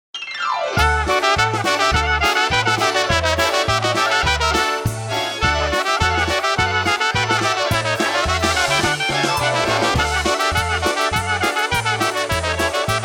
Marys Knocking Polka

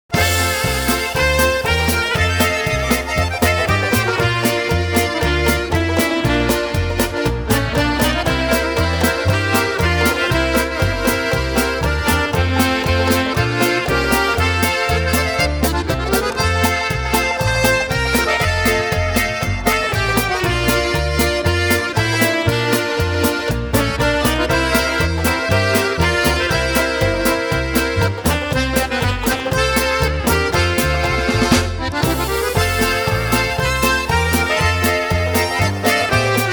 West Wind Polka

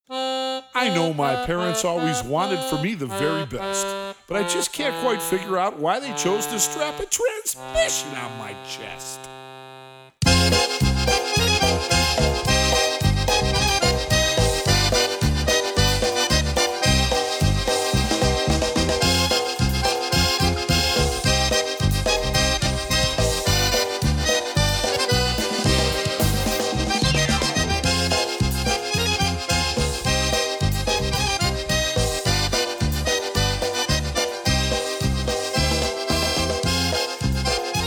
Accordion Man Polka

